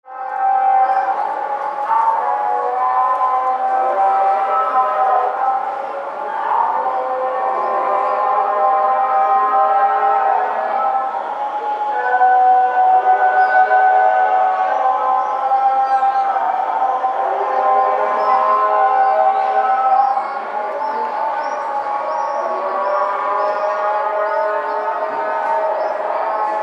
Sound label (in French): Muezzin Essaouira